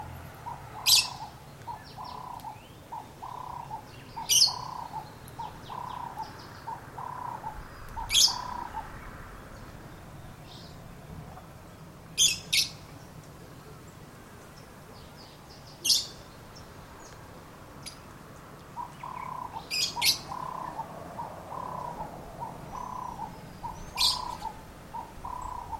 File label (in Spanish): Inseparable de Fischer